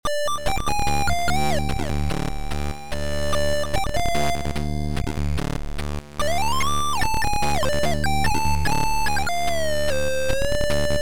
chip chop

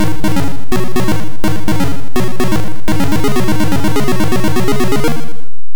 Level Done; Renegade; CNGSoft

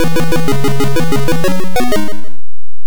Start Level; Renegade; CNGSoft

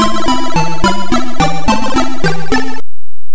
Game Over; AMC. Game Over; CNGSoft

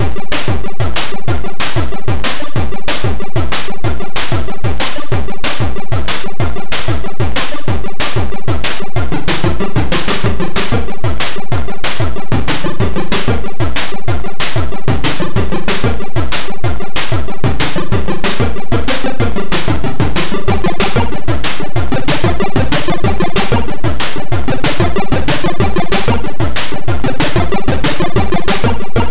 glissandos; Megaphoenix (+glissandos); CNGSoft

